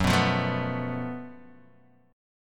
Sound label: Gb Minor Major 7th Double Flat 5th, guitar chord; strummed; 2,2,3,2,0,x